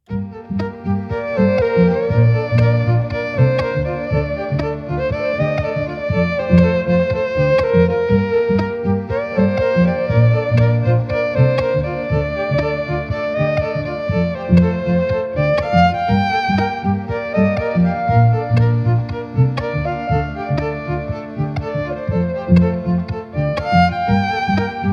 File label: Solo Electric Violin